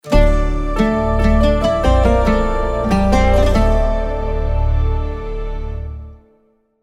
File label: Sonic Branding LOGO; Sharjah Airport Sonic Branding Logo